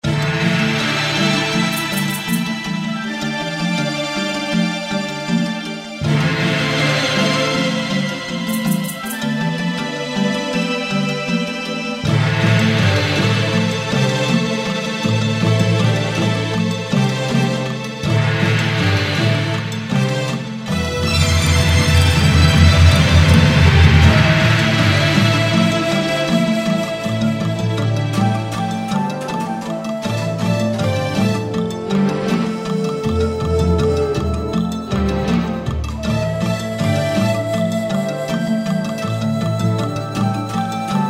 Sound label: Shakuhachi